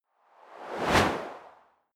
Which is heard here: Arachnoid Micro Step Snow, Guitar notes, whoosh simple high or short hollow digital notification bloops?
whoosh simple high